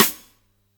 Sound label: soft hitclap